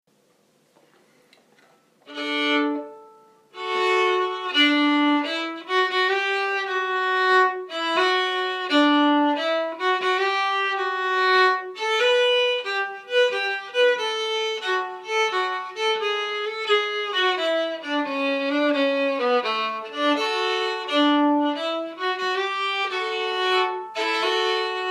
Skinnbracka med lucku stämma

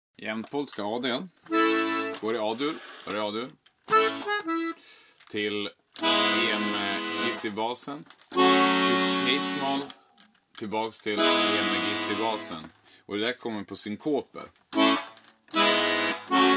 komp Jämtpolska A del

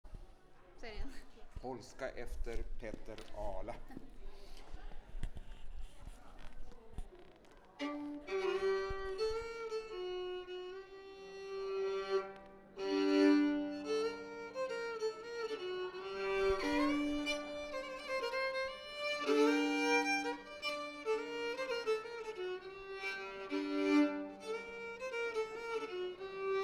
Polska; efter Peter Arla

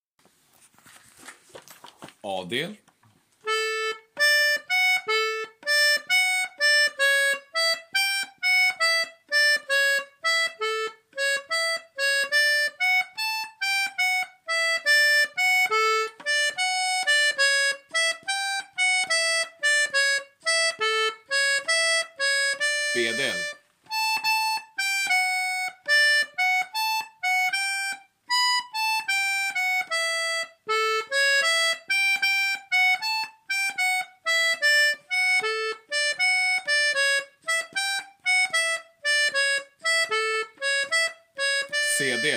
vals stämma långsam; Ulla Stinas

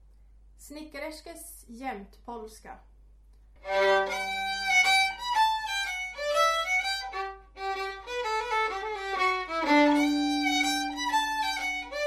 Jämtpolska; Snickar Erskes

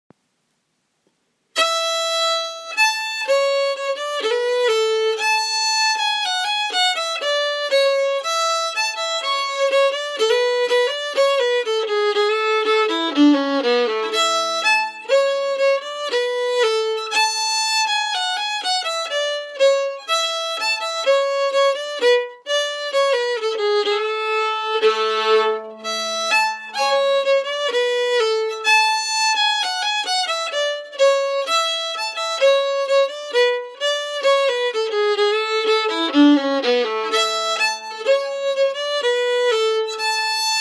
Clarin. langsam

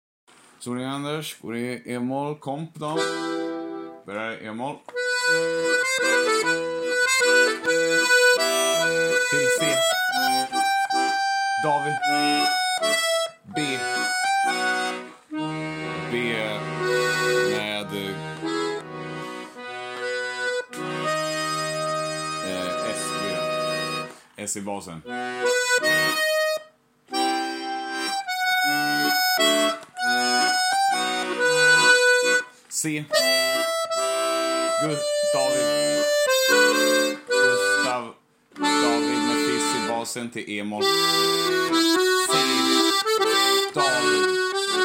komp; soling

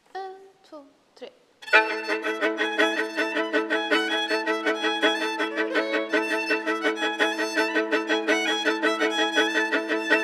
Svängrumpa Fiolkomp A delen

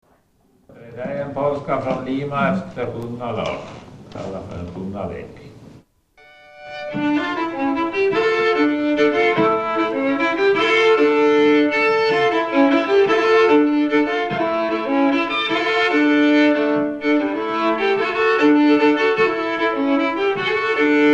Polska; fr Lima e Sjungar Lars

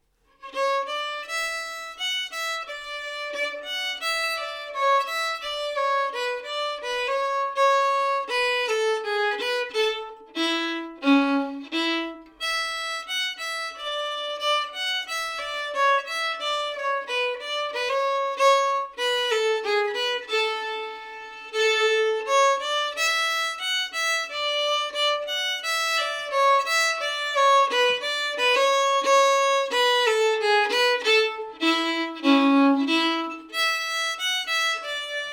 Forsen slow

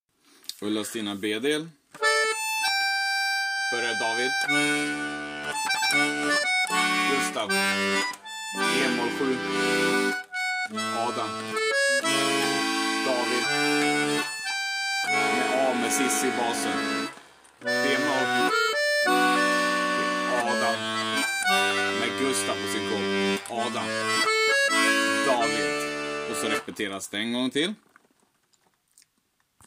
vals komp B del; Ulla Stinas

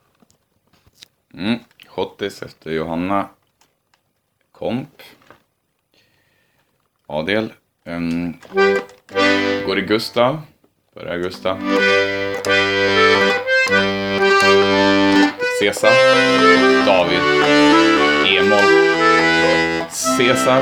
Schottis komp

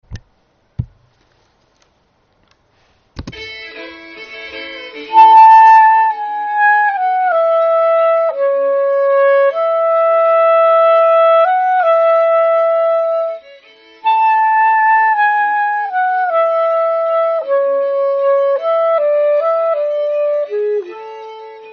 Blåsstämma melodi

